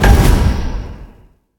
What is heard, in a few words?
fire; smite; phoenix